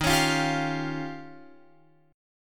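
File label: E flat 13th E flat, down, Guitar, Open F; strummed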